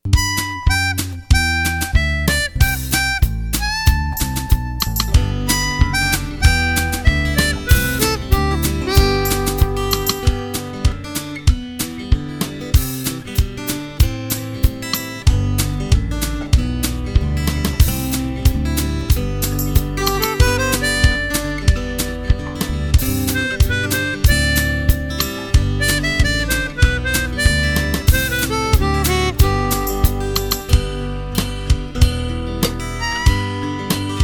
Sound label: Blues